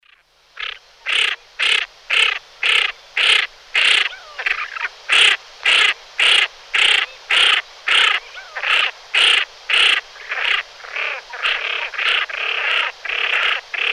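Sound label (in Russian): CHernet krasnogolovaya, common pochard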